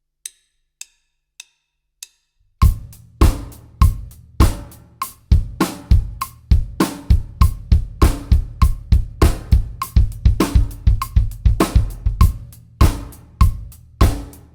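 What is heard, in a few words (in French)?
grosse caisse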